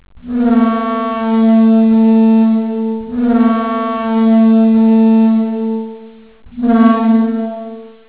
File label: dino sound